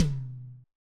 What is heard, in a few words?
RX8 Tom, Yamaha RX-8